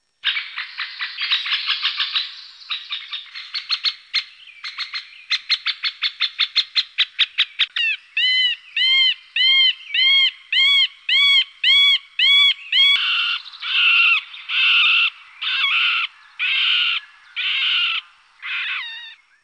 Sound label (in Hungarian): Kцzйp fakopбncs; Madarak